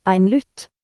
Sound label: guitar; tux; ktuberling